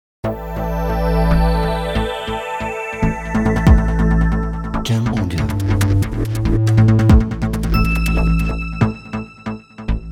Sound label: Electronic Technology